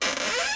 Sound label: door squeak